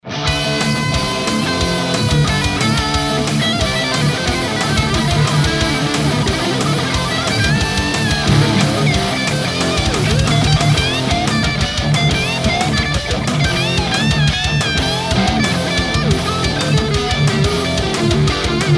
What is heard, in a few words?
digitech hothead